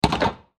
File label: Button Switch; Multimedia Web Interactive